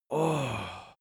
cartoon male relief exercise

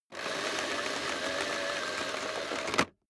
vacuum cable pull in

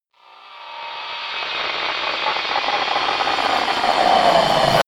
reverse hit sparking atmospheric

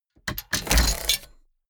mechanics small rattle

